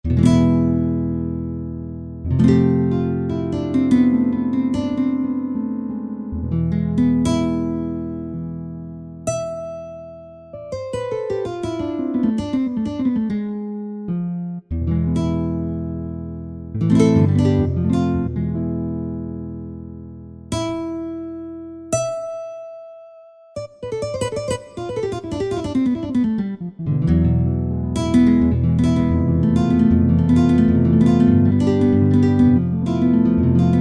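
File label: Guitar Flamenco, Yamaha EX5R; Factory presets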